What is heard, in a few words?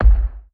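Choir Stamp bass drum, Music Hall Percussion; Aberdeen Music Hall; Drum Hits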